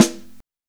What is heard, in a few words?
Snare Live, Drum and Bass; EZ Rollers; Instrument Samples